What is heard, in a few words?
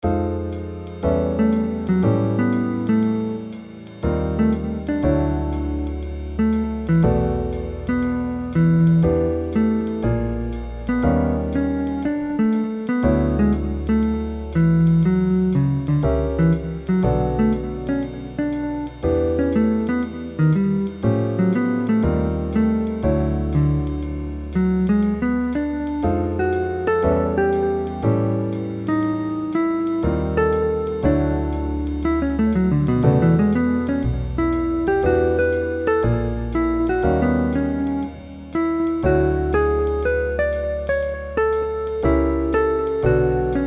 guitar